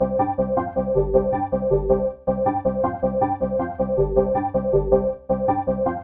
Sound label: Lead Org, Drum N Bass, Keys Pads; Sonic Foundry (Sony Creative Software); Knutrix Drum & Bass